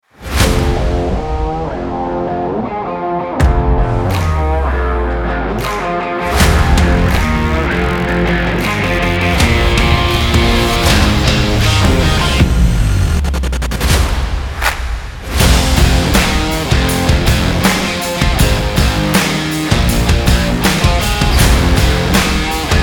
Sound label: heaven hall rock music